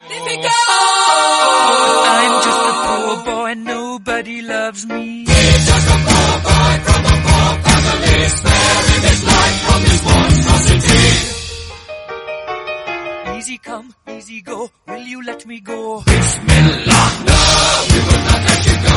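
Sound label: REDRUM 141bpm E flat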